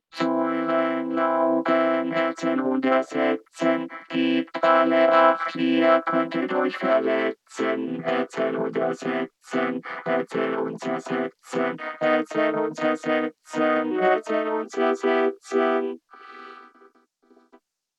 Vocoder; 1Up Mikme VC340

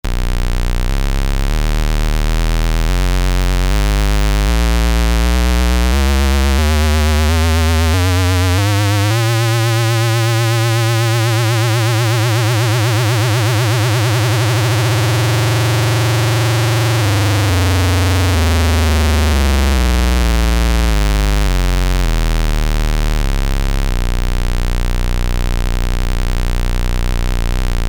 Sonicsmith a1 lfo

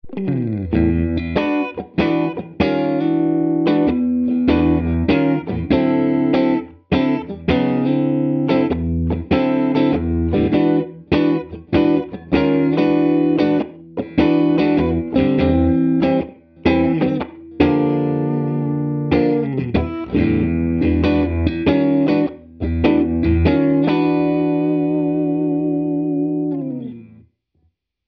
Tone King Imperial MKII Warm Jazz

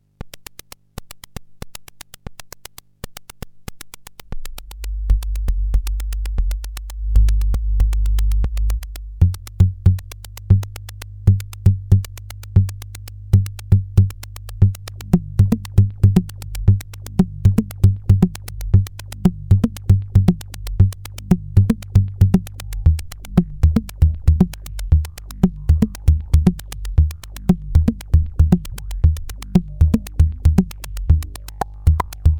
D1000 CV Modulation

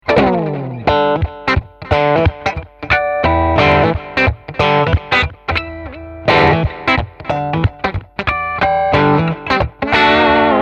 Bridge Single

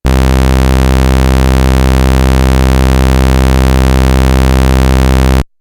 Roland SH-101 Saw

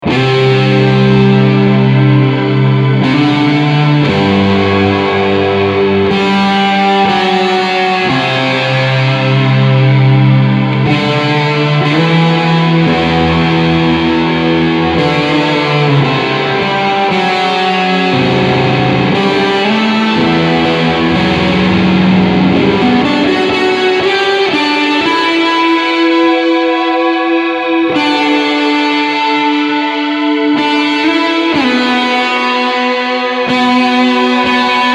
Texture Amp

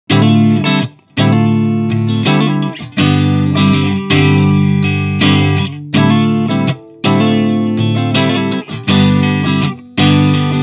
Clean Pentode

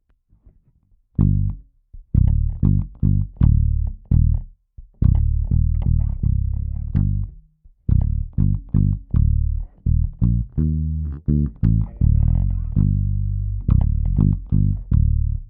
deep

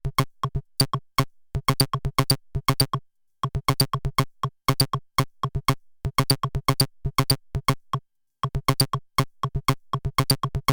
randomRhythm Dice Changing Random

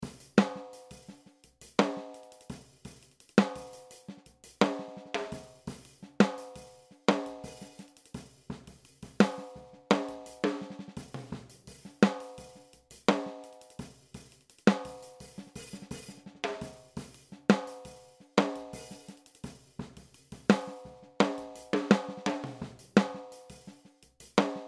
Groove Ludwig Snare

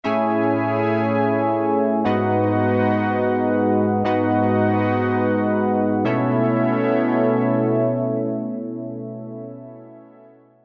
SX240 STRINGS SOLO

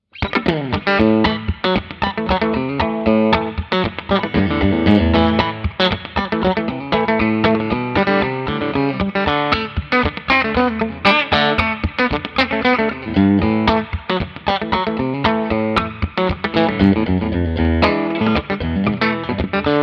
Reverb Light; Morgan PR12

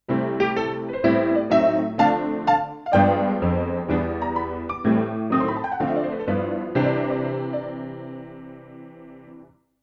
Thomann AK X1100 Honky Tonk

